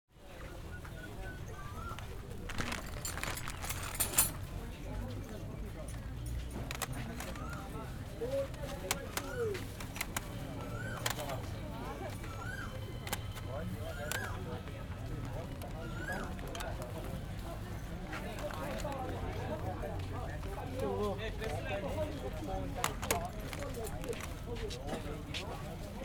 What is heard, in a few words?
Flea Market, Singapore; Les Cartes Postales Sonores; NOISE ON EARTH Vol4